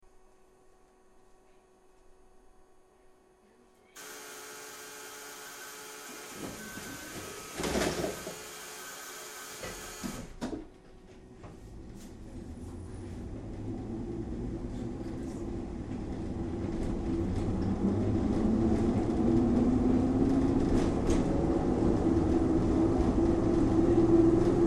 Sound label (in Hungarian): start go, villamosok zörejfelvétele